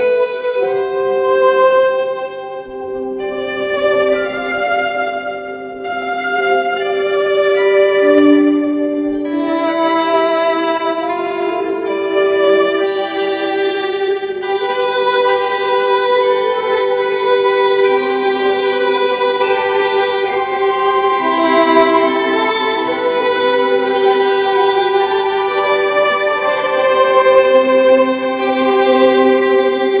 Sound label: Ominous violin music; audiogen